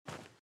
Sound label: run sand